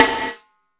supergate snare; single hits

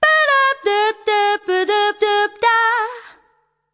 100 bpm Line; VOCAL OR VOXY LOOPS

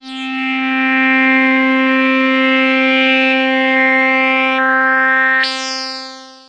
SH101 Tone

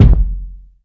classic hip hop kick; Classic Hip Hop Mega Kit

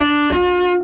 orchestra string hit D

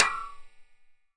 Fracture Hi Hat; ModeAudio Found Percussion Hits

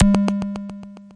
bell clicker

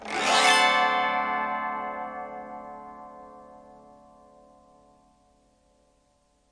autoharp D7; Multisampled Autoharp

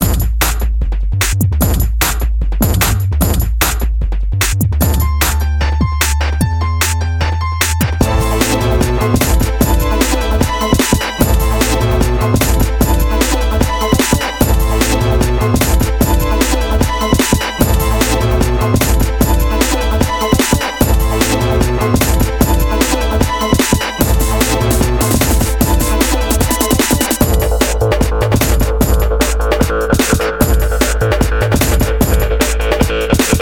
Break 160

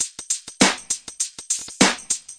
deep hats claps, drum loops and breaks, 100 bpm; 091 to 100 bpm

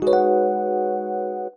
one staggered epiano chord

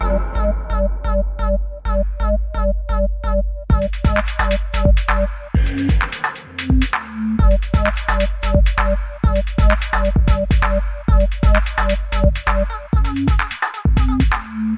130 bpm breach beat main, drum loops and breaks

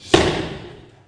verby slap thing, snares; single hits

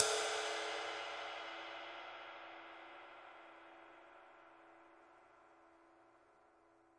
big ride; single hits; Big Believable Rides

